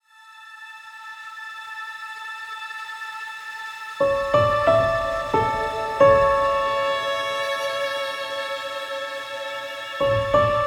SAD SOUL

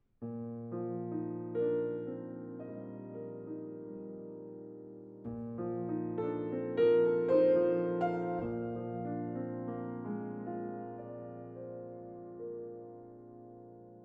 Prelude, Bb Major, piano; Alexander Scriabin; op. 11 No. 21

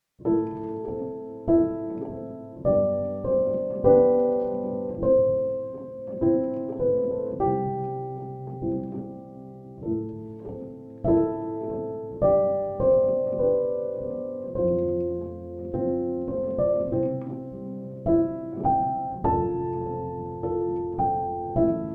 Piano

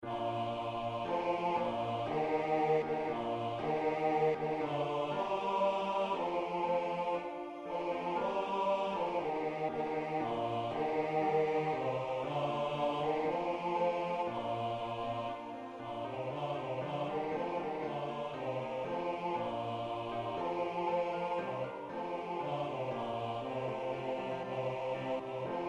bass; Cantate Hassler

